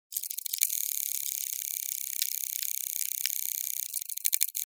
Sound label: courtship purring